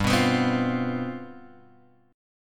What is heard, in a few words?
G 11th G, chord; G11 Chord {3 2 X 4 1 1} strummed